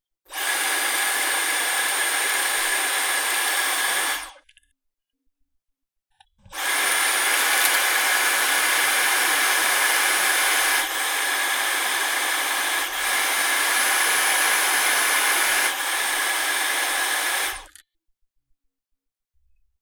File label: dyson DC31 motorhead